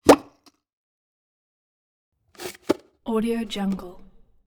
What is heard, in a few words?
tube doink comic higher pitched slide pop